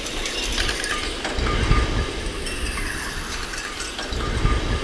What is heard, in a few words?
HARBOR; East West; Global Sounds